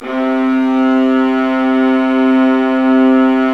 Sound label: Violas Bright, String Sections; Roland LCDP13